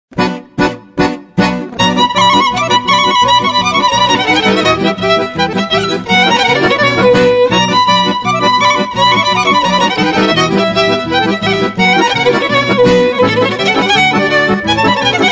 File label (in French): HORA ROUMAINE trio czardas